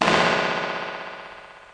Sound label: analog delay hit; Weird and Interesting Hits